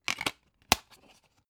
dvd case put in dvd

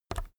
keyboard external key release soft

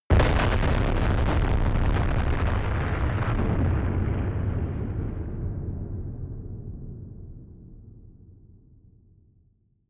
distorted explosions stereo

